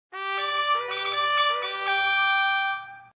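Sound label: trumpet; smeshariki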